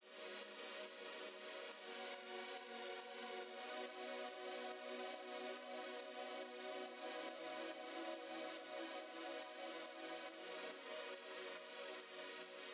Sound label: Pad Dry